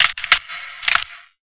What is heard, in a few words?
ice break